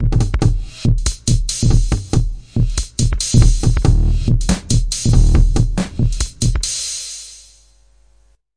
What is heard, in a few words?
140 bpm, super compress tom hats, drum loops and breaks